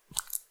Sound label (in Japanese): くちゅ音単発